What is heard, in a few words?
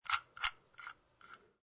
Lock